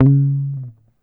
GTR TRIK, Jazzistic; Univers Sons

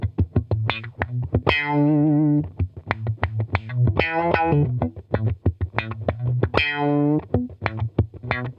Funk Guitar; Zero G - Funk Guitar

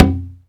bata; AKAI S-Series CD-ROM Sound Library VOL-5

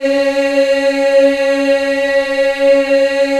C#3 WOMEN EH, Choir; USB Soundscan vol.28; Acoustic & Synth; AKAI

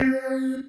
C Synth; M8; Electronisounds; Enigma Dub Cube One Shots

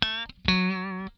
lick scale, funk guitar; Zero G - Funk Guitar

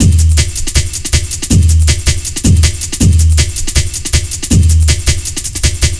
160, Drum Loops; Total Drum&Bass

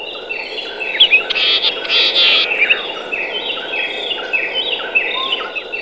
JUNGLE DAWN; Zero-G Ambient 1; JUNGLES

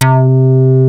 SPLIT; Rubber Chicken Software Sample Bank

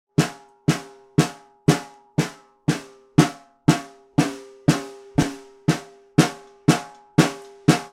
Vintage Ludwig Snare, Percussion

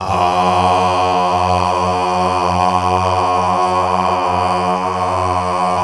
GOSP. AAAHH; Sound & Vision - Gigapack II CD 1

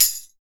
TAMBOURIN, HR-16 DRUMS; Drum Mashines; EdgeSounds

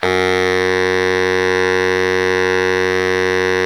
SOLO BARITONE, Pop & Funk Brass; Best Service ProSamples vol.25; AKAI

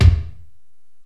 STEREO DRUMS

